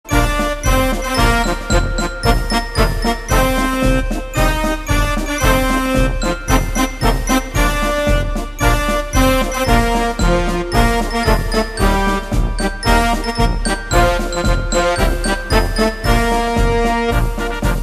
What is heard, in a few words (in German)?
Klingelton